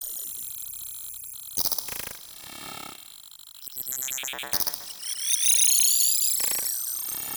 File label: Scifi Loading Bars